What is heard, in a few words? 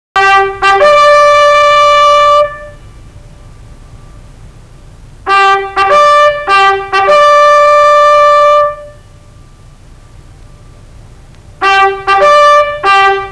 trumpet